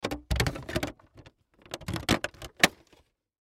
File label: Toy Ship Attach Piece Plastic; Toy Plane Sound Effects; Foley